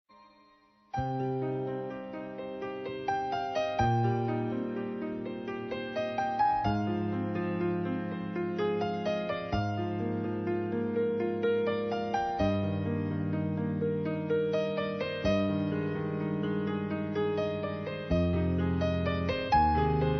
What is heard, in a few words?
Nocturne Piano